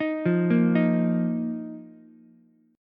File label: A sharp Suspended 4th Ukulele; arpeggiated; 8,5,6,6; JGuitar